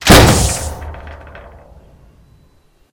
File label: Door Close